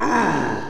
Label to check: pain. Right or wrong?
Right